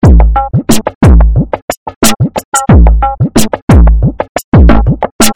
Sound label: drum loop, funky